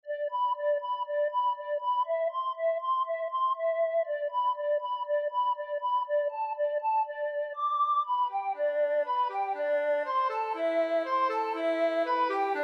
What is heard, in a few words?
Good mood, slightly quirky, medly, Film music